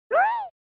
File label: Fighting Scream; SNES